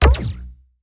shoot; lightturret

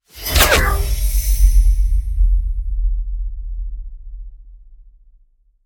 sacrifice for guard; battle

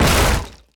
cannon hit; pirates

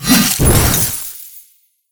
icicle sword; battle

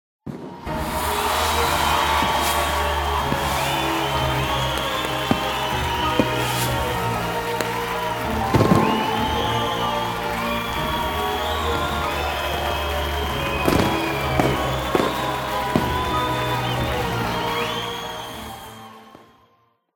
game win

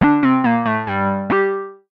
blocked, dominos

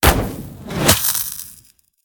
flame arrow; battle

